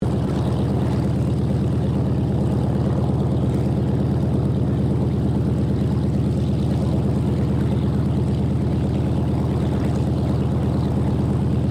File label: AIRCRAFT AT-6D HARVARD MK IIB IDLE, Harvard Training aircraft; 1942; Airplanes; Vehicles